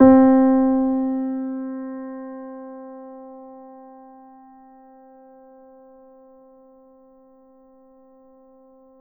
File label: Grand Piano C3 p